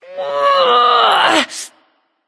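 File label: grunt lift, female; smite; voicepack; hera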